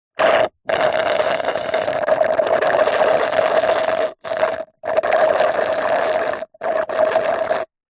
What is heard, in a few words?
food drink milkshake thick suck dregs straw slurp; zapsplat